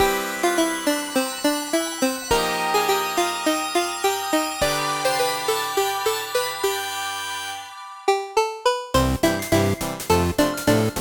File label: xmas chiptunes